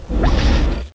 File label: telekinesis